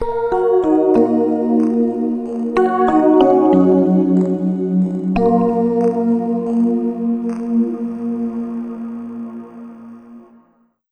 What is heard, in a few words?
Ambient Keys